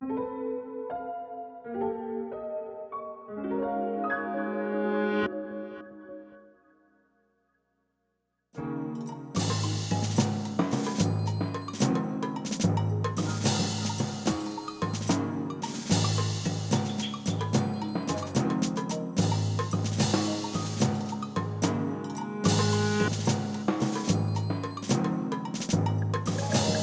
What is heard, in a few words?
Rainfall (Noon, Battle); Pikmin 3